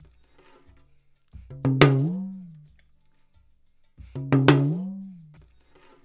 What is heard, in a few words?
percussion loop; InVision Interactive; Percussion Slam; UDU-TALK